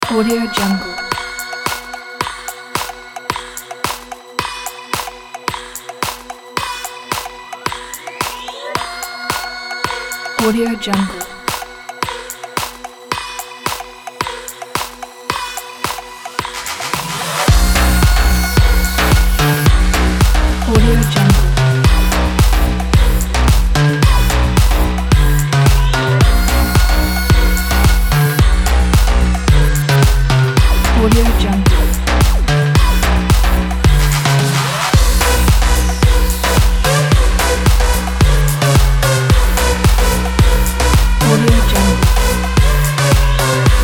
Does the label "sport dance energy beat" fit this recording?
Yes